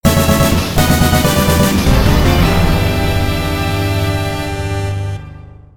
stage clear

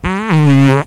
fart; Sh*tty Jim – Fart 10

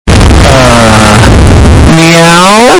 Sonic meow earrape

